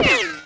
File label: miss hit